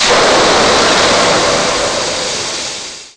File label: Coolant Dump